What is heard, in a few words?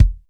boom bass drum sound f key; kick drum samples